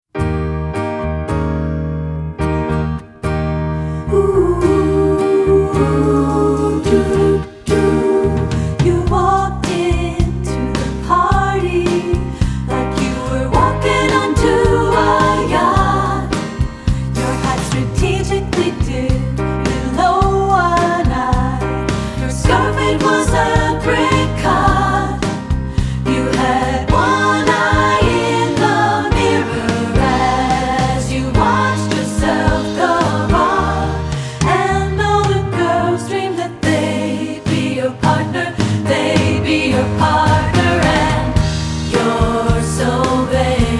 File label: SSA